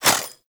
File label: Throwing Star Whizzby; Warframe; Bullet Impacts; Whizzbys